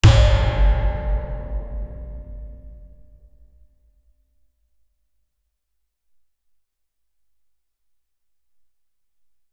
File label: 8 ball, bass boosted